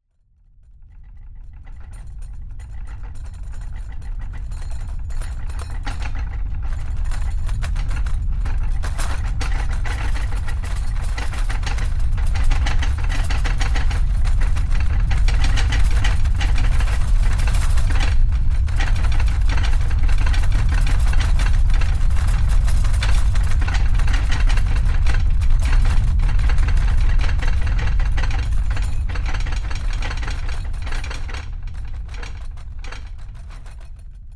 nature earthquake inside house kitchen crockery cutlery shake rattle low rumble